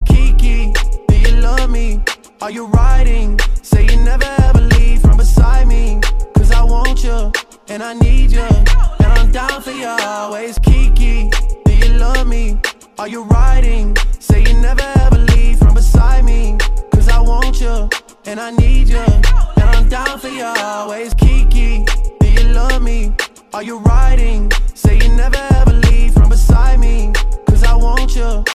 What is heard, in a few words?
Ringtone Mobile